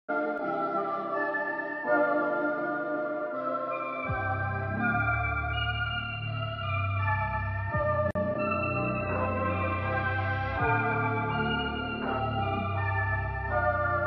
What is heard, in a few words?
ARTURIA ANALOG LABS; Up North Music Group; LOOPHOLE